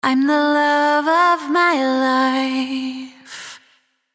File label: Vocals Vocal Universal